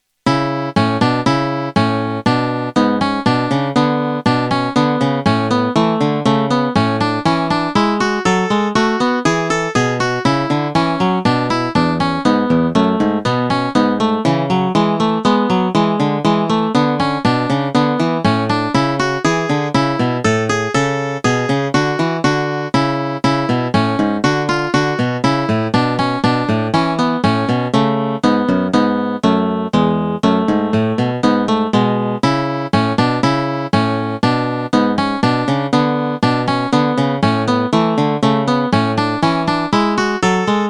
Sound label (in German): Duett in A Dur